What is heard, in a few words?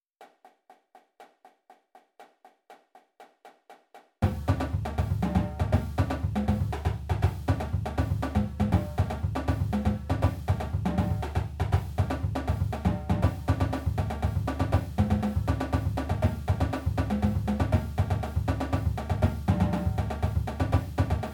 doubletriple, percussion